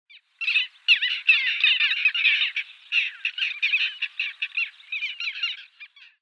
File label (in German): Zwergmoewe Larus minutus